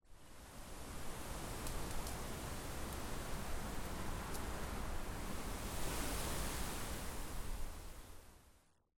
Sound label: Wind; silent hill downpour; weather fx